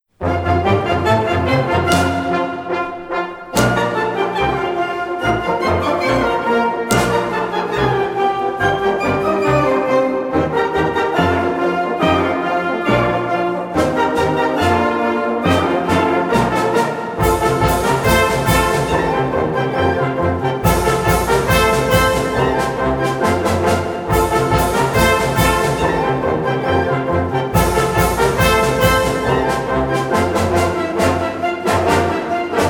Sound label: Polka